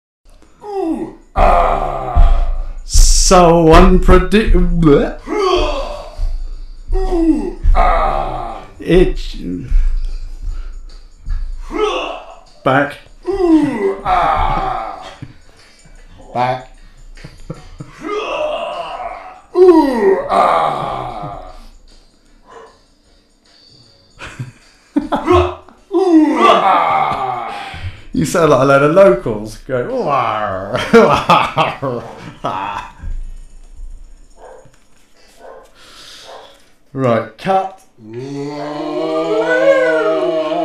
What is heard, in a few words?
ooooaahh